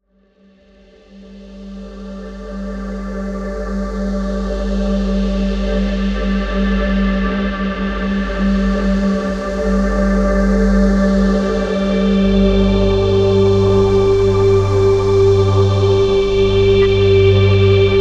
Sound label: Lush Pad G